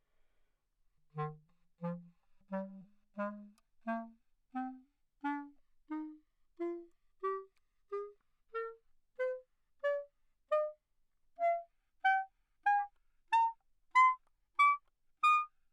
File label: classical clarinet bb, Anechoic; OPENAIR